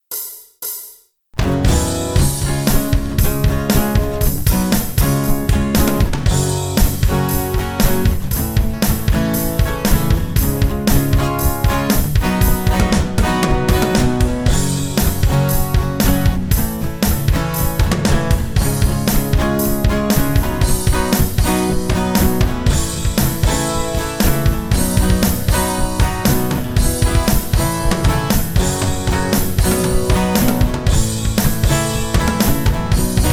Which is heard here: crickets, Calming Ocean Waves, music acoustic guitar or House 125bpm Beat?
music acoustic guitar